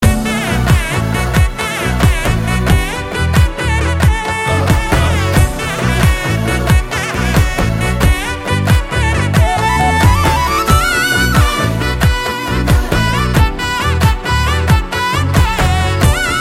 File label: Love Bgm